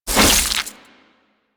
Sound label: Melee Whoosh; Warframe; Infested; Zealoid Prelate